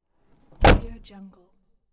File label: old car door slammed; cinemandre